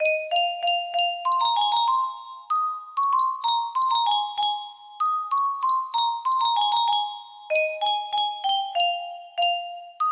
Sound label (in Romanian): cutie muzicala